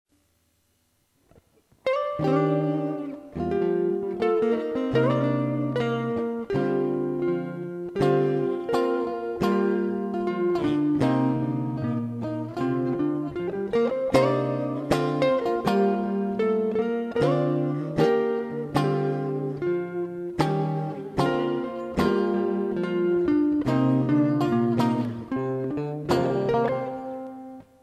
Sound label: koto